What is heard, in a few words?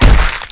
slash, weapons; Hexen2